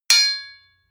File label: hammer hit metal ring